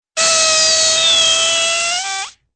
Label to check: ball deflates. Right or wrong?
Right